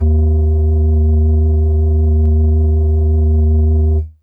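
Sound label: Pan Flute DX100 D#3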